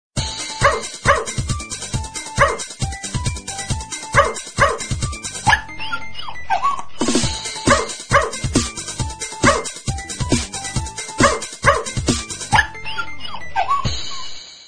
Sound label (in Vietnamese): nhac chuong tieng cho sua